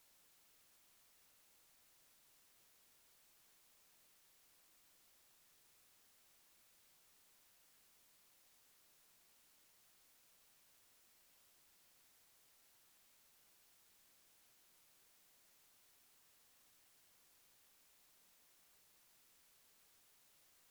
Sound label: 28040kHz RF; SK6OSO